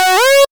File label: Synths C#; Vengeance Samples